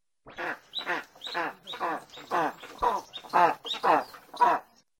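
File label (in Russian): mogilnik golos, eastern imperial eagle voice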